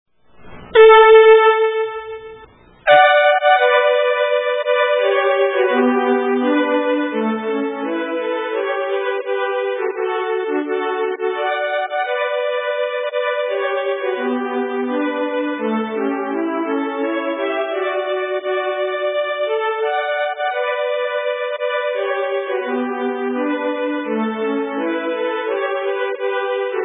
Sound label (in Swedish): Majas polska